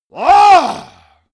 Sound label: monster growl attack throaty; schreie